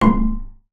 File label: BASS FINGER; Xsample VOL-5